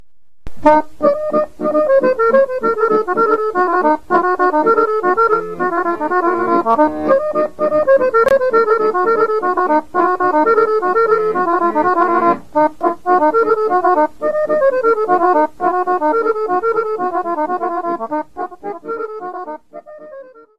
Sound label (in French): Horo - Bulgarie